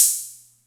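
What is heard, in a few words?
Atlantex Music Percussion Computer hi-hat closed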